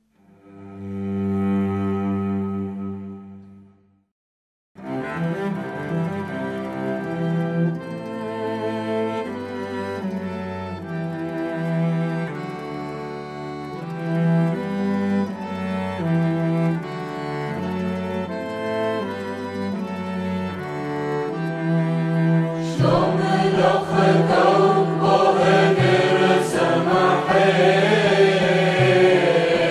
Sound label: Choir; Mor Ephrem Choir; Shlomloch